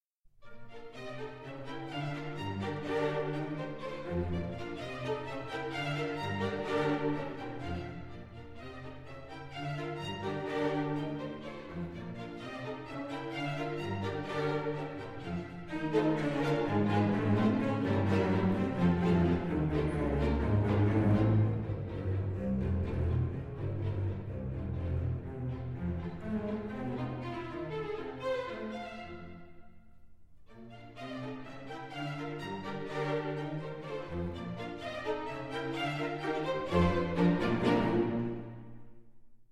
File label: polka